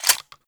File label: Hand Shotgun Reload, Weapons; Hand Shotgun Prime